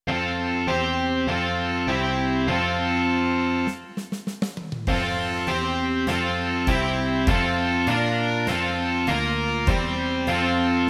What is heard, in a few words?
rock